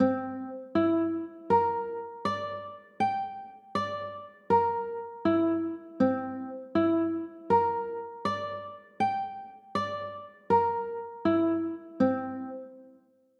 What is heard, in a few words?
c 6, chords